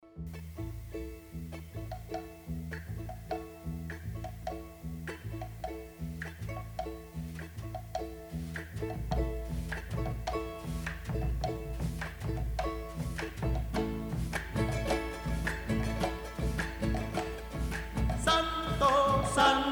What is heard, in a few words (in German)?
Sanctus Sopran